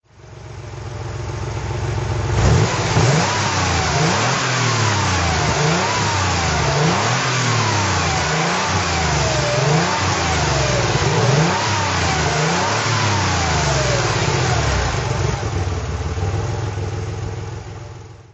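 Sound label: 911 carrera 32 throttle, Porsche 911 3.2 Carrera; 1987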